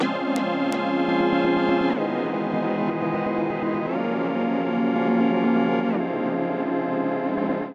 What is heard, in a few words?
Chords G# Minor 124 bpm; Ableton; Factory Packs; Skitter and Step; Mango